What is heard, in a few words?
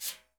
flick; Northstar - New Gold VOL-2; 30S BRUSH